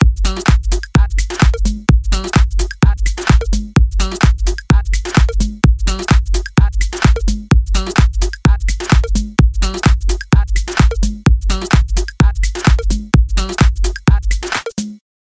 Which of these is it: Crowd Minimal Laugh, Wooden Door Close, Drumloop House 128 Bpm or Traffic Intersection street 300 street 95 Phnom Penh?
Drumloop House 128 Bpm